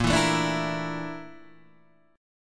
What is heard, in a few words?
A sharp Major 7th Flat 5th, Guitar, DADGAD